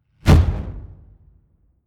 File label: sci-fi fire ball rough